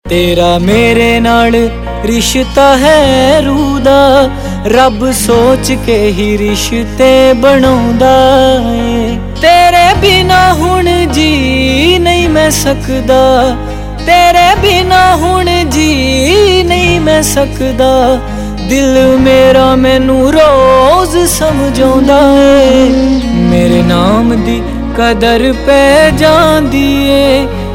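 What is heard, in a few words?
Ringtone, Punjabi Pop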